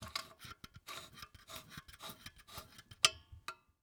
Technology Electronic Light 650 Watt Fresnel Light Flood Knob Turning